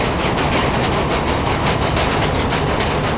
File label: trams loop